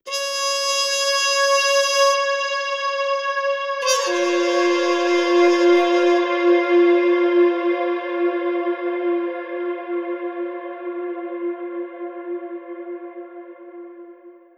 Muted Trumpet Galactic Cathedral Binaural; Blackhole Immersive

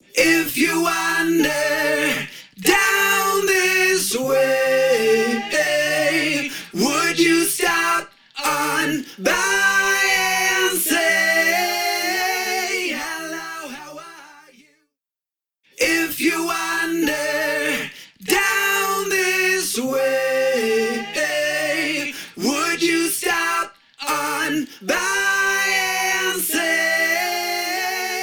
Vocal Bus H3000 MicroPitch Binaural; MicroPitch Immersive

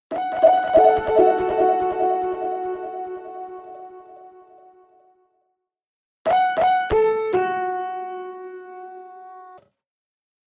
Keys All Pass Gallops; H3000 BD Mk II

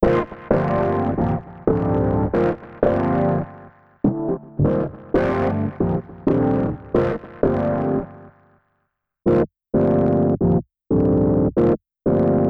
Electric Piano Deep Whurli; Preset: Deep Whurli; UltraChannel

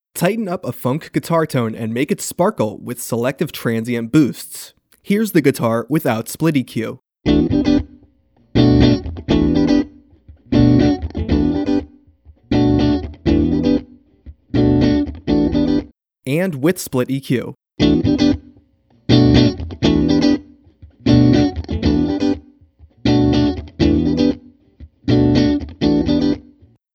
Enhance Guitar; ギター; SplitEQ

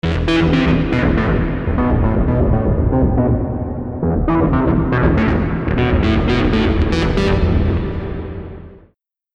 Stepped Synth Shaper; Preset: AB Stepped Synth Shaper; Generate; Newfangled Audio

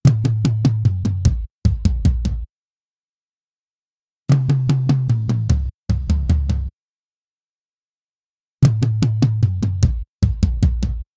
Heavier Lower Toms; Preset: Heavier, Lower Toms; Physion Mk II

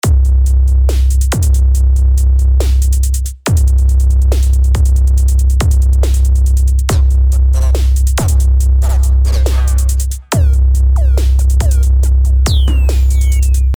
Trap 70bpm

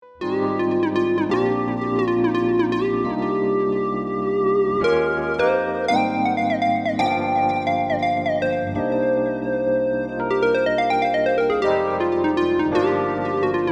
Clear Lead; dexibell vivo s2

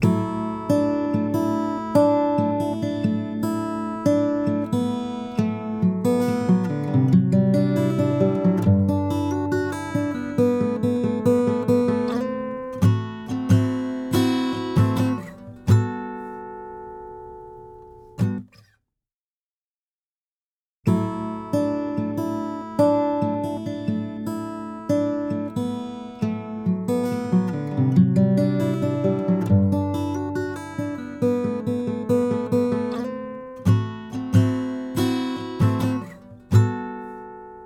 Soft Compression Acoustic Guitar; Omnipressor; Preset: Soft Compression

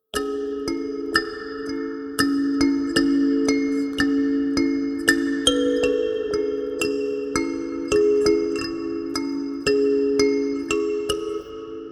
Thumb Piano Deep Space; Temperance Lite

